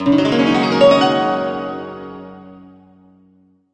Result Success Jingle; Gym Break

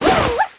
slide

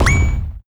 coin

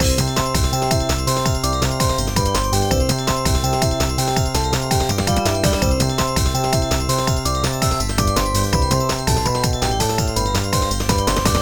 Training bonus time night common

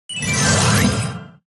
magic candy bow spawn; Blue slush cookie

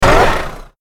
Transform Jump; Roll Cake

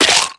Captain Ice Jump

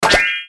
Magic; Cinnamon

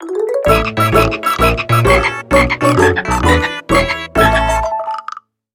bgm skill 130; cucumber Frog; Master

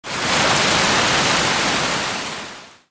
Rain Start; Herb

